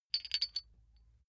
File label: small metal handling jingle single; industrie wirtschaft; metall